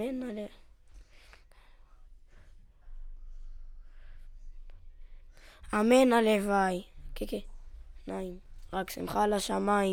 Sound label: VOC